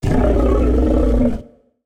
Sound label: monster